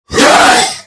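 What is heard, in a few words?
lizard attack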